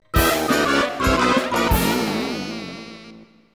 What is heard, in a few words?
Lose; Mario Party 2